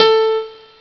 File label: m1 piano 8', Amiga Soundtracker Sample Packs